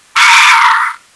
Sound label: pain; csco; csgo; bot